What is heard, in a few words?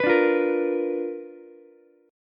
B Minor Major 7th Flat 5th Ukulele; strummed; JGuitar